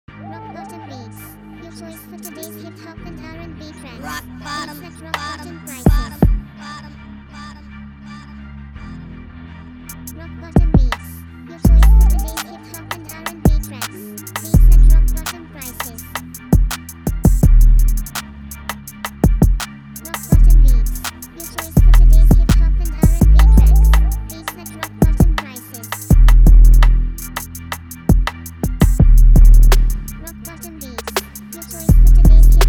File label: BEAT